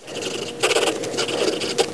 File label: CRAYON